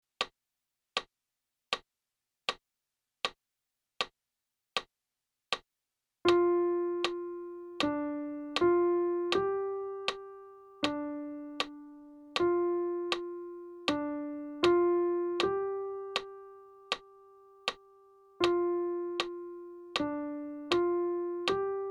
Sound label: Indian Campfires 80bpm